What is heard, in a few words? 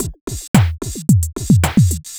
Beat, Drums & Percussion; Electrocution; Beats